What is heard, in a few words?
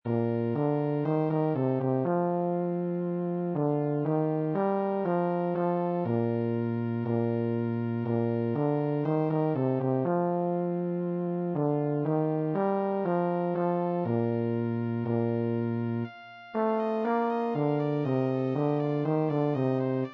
ding dong lo; Ding Dong Merrily On High 2010 BASS PART